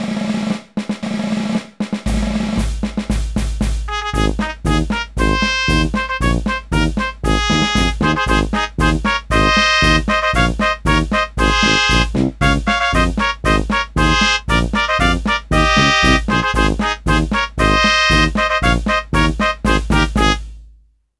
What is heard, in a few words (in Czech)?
instrumental